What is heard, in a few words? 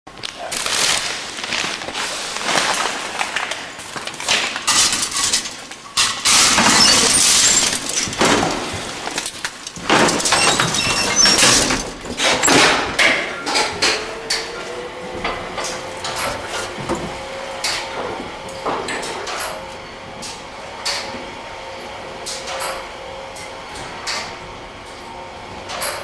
elevator, noise